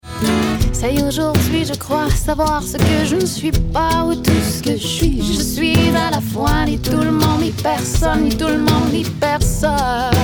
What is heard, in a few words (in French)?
CHANT STUDIO